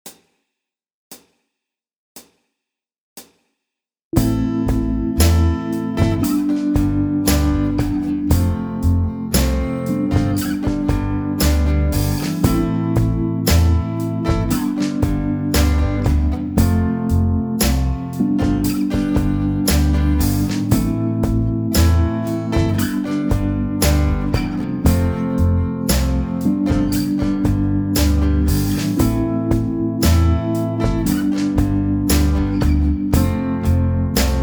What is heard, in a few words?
Grand Finale Accomp Slow, Guitar